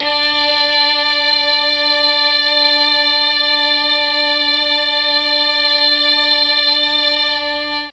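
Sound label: D4 string brass, mellotron; STRGS+BRASS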